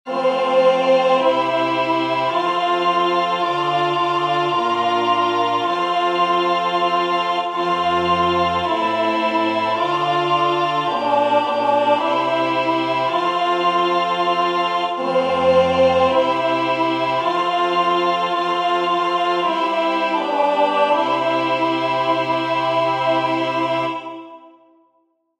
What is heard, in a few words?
Kyrie alto; singing divine service iii in parts